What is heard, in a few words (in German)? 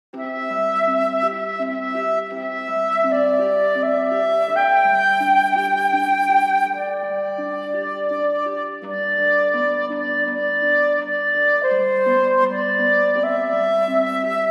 glocken; musicfox